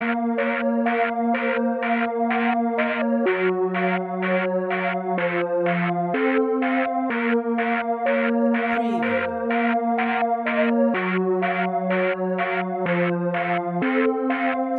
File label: Dark Trap Melody